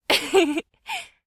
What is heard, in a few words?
Giggle